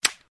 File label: Typical Click Clack